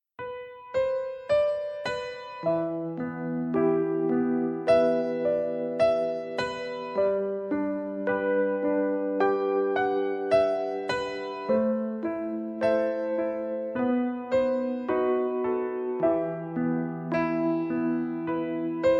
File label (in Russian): Колыбельная